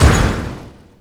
Large Spike Pillar; New Super Mario Bros. Wii

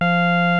music note; super mario paint

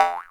Spring Board; Wii

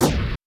Thrush Punch; Jigglypuff-Purin; Super Smash Bros

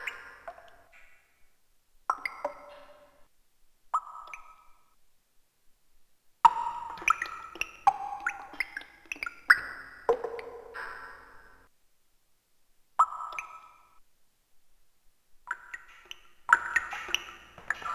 Sewer Ambience

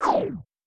Swoop; C Falcon; Super Smash Bros

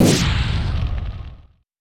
Acid Burn; Super Smash Bros

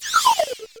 Kamek Teleport In

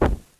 Fire Ball Hit; New Super Mario Bros. Wii

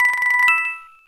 Coin Battle Coins Counting

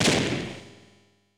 Shooting; Super Smash Bros

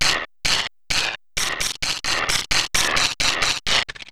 erase; super mario paint

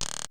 bomb fuse loop; super mario paint

